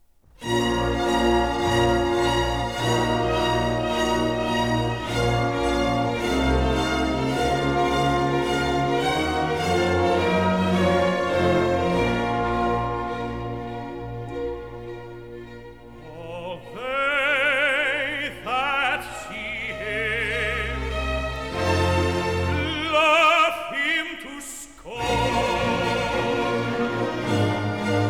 Recit (Tenor); All they that see Him; Messiah

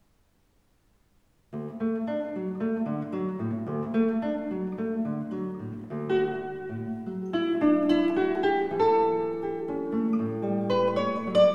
for guitar; Guitarra española; from Canciones populares catalanas

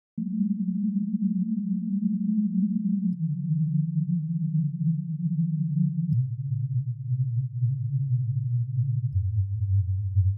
Subwoofer phase: (fast); Subwoofer Evaluation and Setup; Surround Spectacular

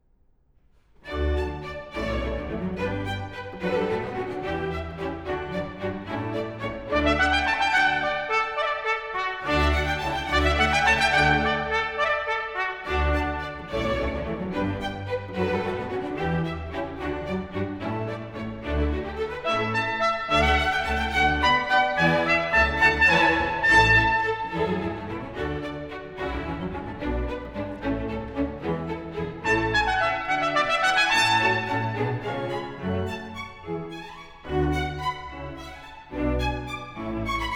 Sinfonia for trumpet, strings & continuo, Allegro

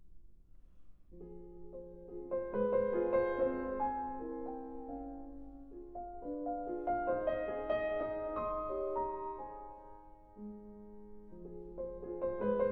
Carnaval for piano; Aveu; Schumann

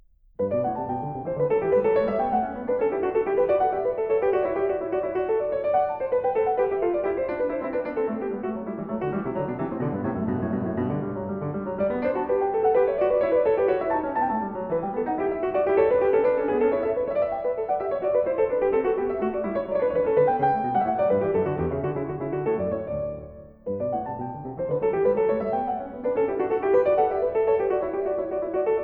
for keyboard

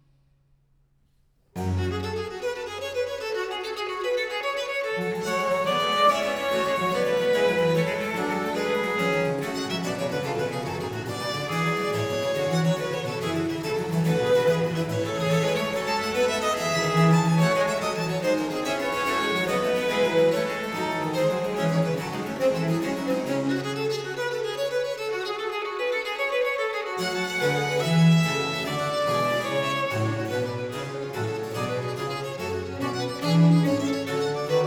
Sonata in G minor, Allegro, Trio Sonatas; Handel

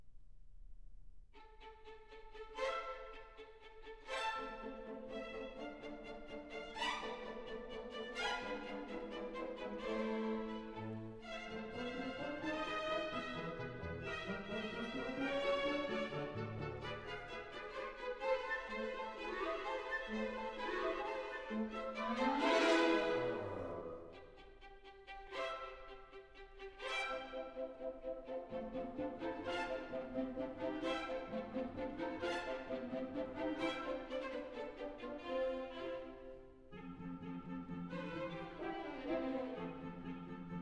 suite for orchestra; 3. the Hen; Respighi

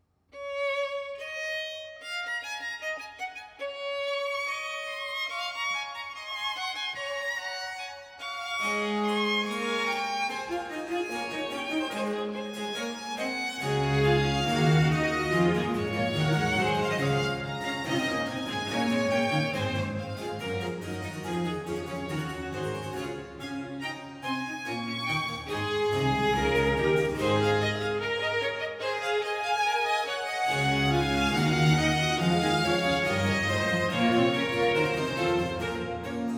Concerto Grosso in D major; Corelli

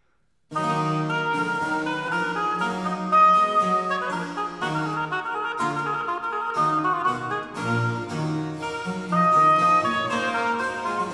Sonata for oboe & continuo in E minor; Essercizii Musici No. 11/21; Allegro; Vivaldi, Telemann; Oboe Sonatas

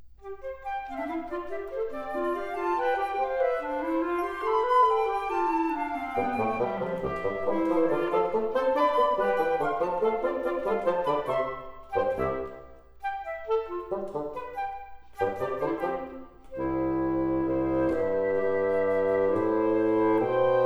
Spaced Pair of Omnis; The Best of Chesky Classics; Jazz and Audiophile Test Disk, Vol 3

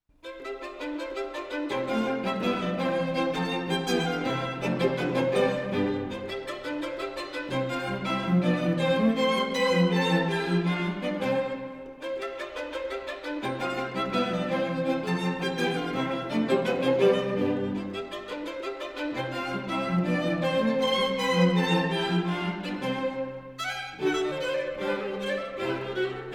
String Quartet in C major, Presto; Mozart

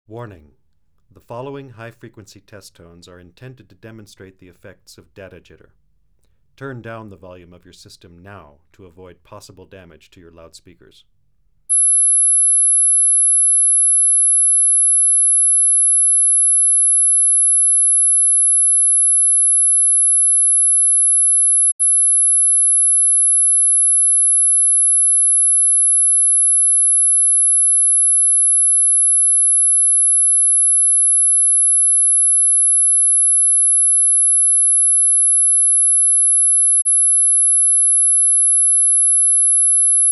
Jitter demonstration track; (L+R); Stereophile Test CD, Vol 2

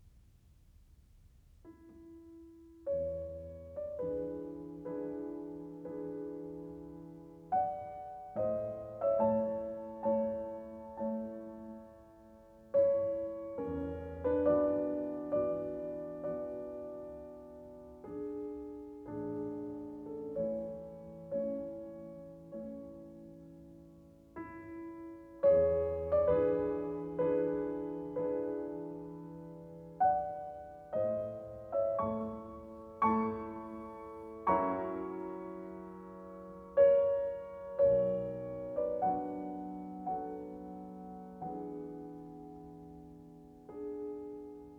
Preludes for piano, in A major; Chopin Waltzes 4 Scherzos 26 Preludes

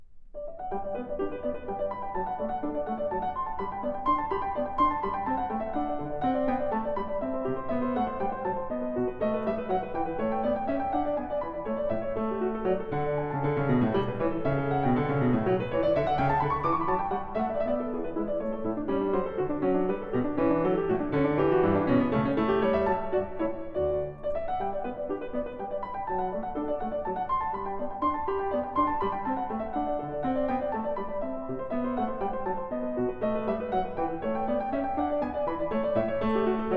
Partita in G major, Courante; Partita No. 5; BWV 829; Bach

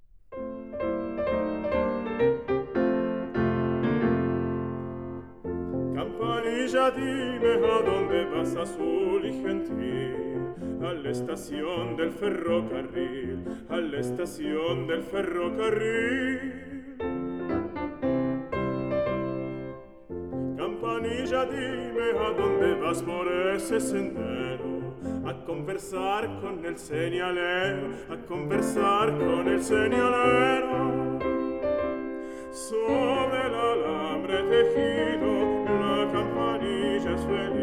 song for voice & piano

